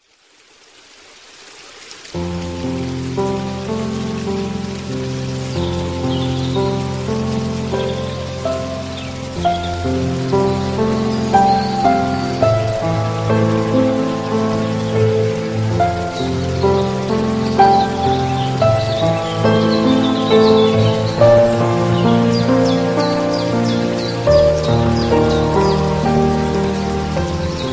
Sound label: Relaxing Music Sounds of the Forest; Monasterio Piedra